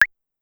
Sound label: Claves 808 Up, Pitch Env; Clavia DDrum-AT; Pitch Env Various